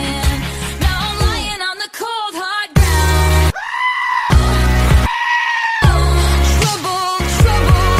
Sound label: screaming goat sheep